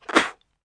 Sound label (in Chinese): 吐草药